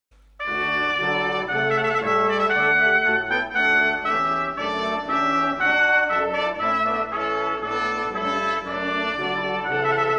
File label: Trumpet voluntary; goldenbrass